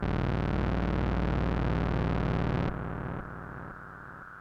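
monotron delay - a sharp m - 116, Loudest frequency 441 Hz Recorded with monotron delay and monotron - analogue ribbon synth; instruments; loops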